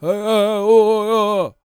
World Voices, CHOCTAW; Spectrasonics Vocal Planet CD5

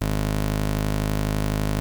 Prophet 5 G#2, Synthesizer; Hollywood Edge - Giorgio Moroder Rare Synthesizer Collection

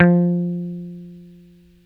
BASS, F 3

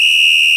Whistle, Percussion, Room

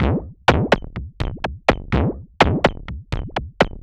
perc 125, Percussive Loops 125 bpm; cominthru

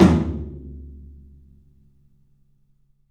TOM, Low Toms, 3D Percussives

